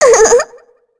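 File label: Laugh, Korean; heroes; Shea; kings raid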